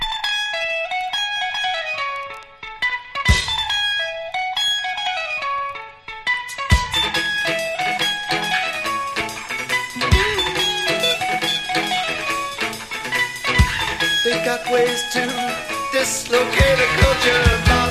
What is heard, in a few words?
MARIMBA JIVE; 1978